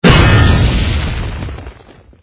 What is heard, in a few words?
metal explosion